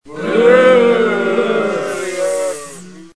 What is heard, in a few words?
sfx audience boo hiss